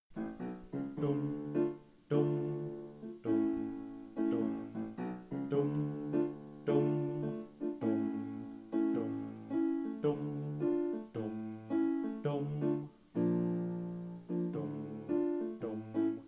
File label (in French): armstrong basse